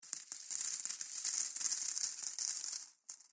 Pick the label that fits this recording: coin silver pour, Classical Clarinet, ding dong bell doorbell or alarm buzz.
coin silver pour